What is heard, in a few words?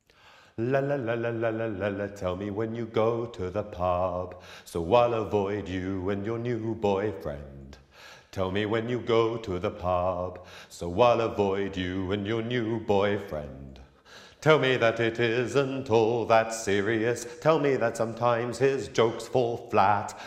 A Choral Interlude